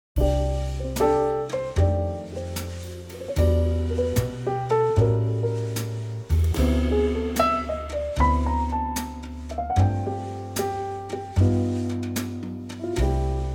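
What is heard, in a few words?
C, backing track; key - C - vocal range - F to E - suit lower female range; Very lovely TRIO arrangement of this great old standard!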